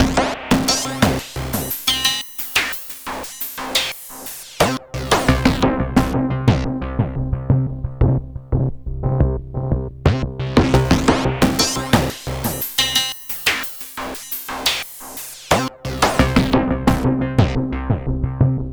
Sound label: modulation mayhem 88 bpm; arturia matrixbrute